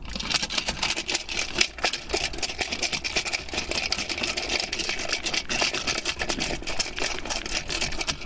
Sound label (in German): Kettenrascheln